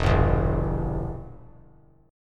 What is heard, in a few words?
E Diminished down; Edim strummed; JGuitar